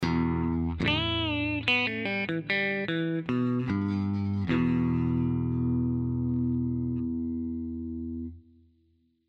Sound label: clean